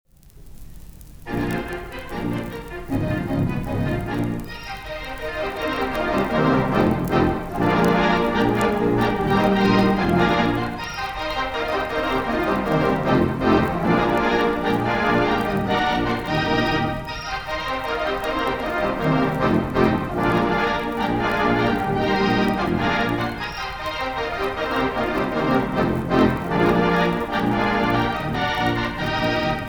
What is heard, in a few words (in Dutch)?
Orgel